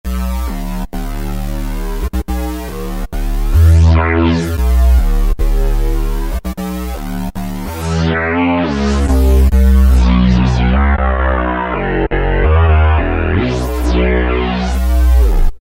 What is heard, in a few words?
Korg microKORG Unison SQU Bass; Factory Voices